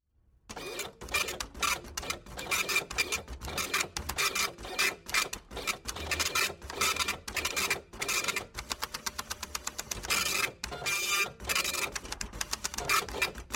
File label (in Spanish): impresora de ordenador